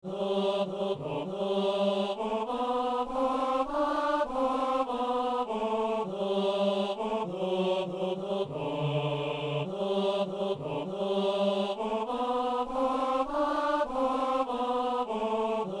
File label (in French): basse